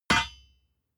hammer hit steel

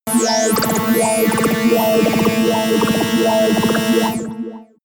alien synth

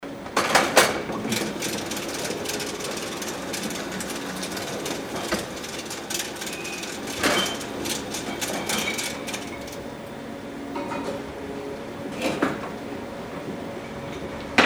bottle case stack

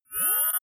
interface beep

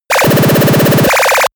arcade play

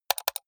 computer mouse left click double hard

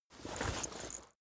leather metal movement soft slow single

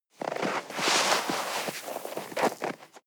snow grab soft

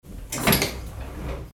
train door cabin inside opening

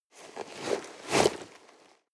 nylon leather hard short

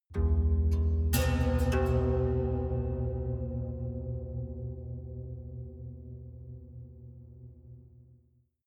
haunted strings sequence low soft

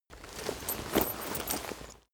leather metal handling short

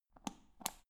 power button turn on off

